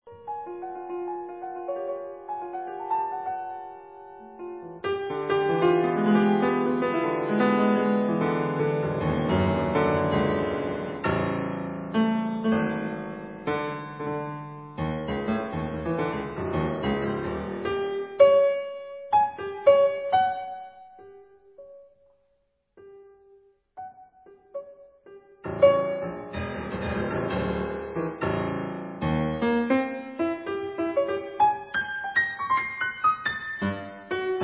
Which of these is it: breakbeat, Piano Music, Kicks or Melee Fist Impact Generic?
Piano Music